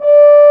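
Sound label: French Horn mf D4; Digital Sound Factory Virtuoso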